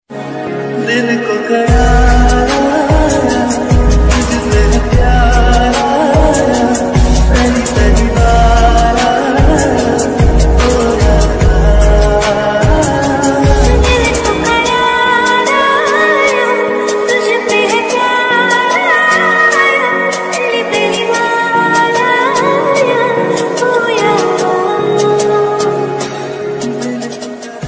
new ringtone hindi love story song ringtone cute love story sms ringtone